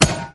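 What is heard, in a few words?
click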